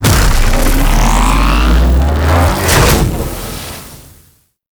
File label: Void Dragon Body Ring Cast; Warframe; Duviri; Abilities